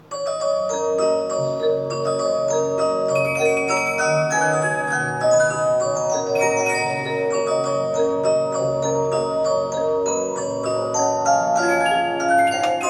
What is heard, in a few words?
Regina Music Box Style 11